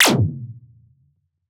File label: weeee